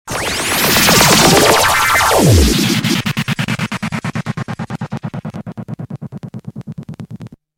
combo swirl

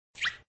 cartoon jump sound effect

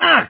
rebel grunt sound; lego star wars

